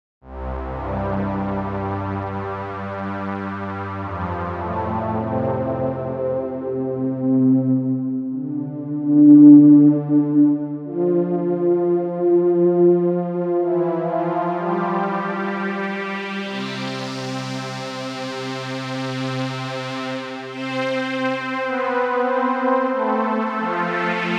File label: analogue